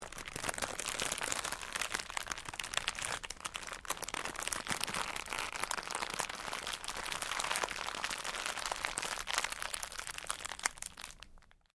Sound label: Cellophane crinkle